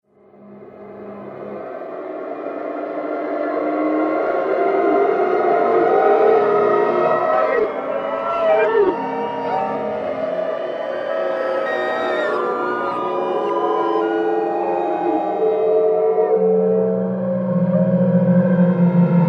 Purgatory Soundscape, Scary Soundscape Sound Effects